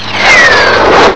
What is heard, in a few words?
JET PASS; CMI Sound Library Rev 1.3; MACHINES